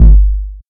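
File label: Low bass drum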